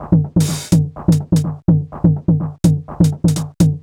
perc reduction 125, Percussion Loops, Classic Chicago House